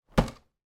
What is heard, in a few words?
food cereal box put down on surface